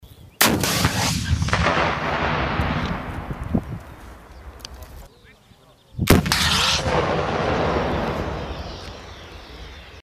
rpg 7 vystrely

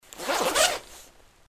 molniya kurtki

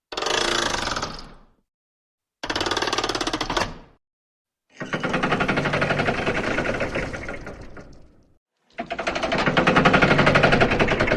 mehan, mehanicheskie zvuki

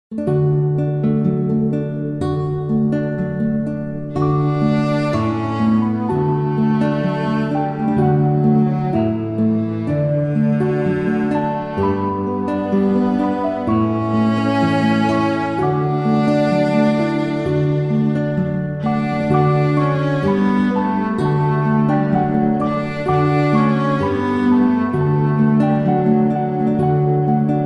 rojdestvenmuz

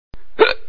ikota

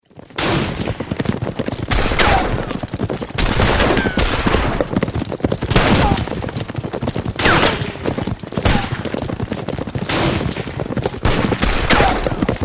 zvuk perestrelki kovboev

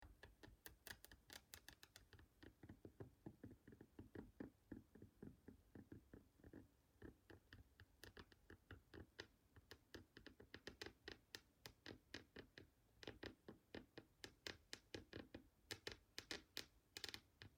myslitelinyi pocess, zvuk gde chelovek dumaet ili zavis

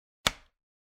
magnit na holod

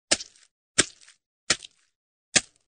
tykaut telo nojom

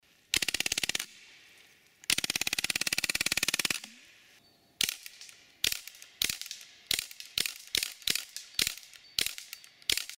mp40 pnevmo

